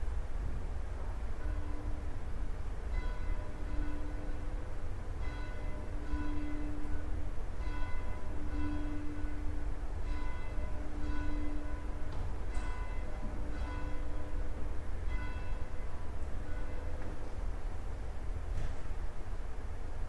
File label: bell